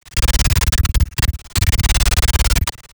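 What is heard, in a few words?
Amp Stutter Glitch Tremolo; GlitchBlog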